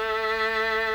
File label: violin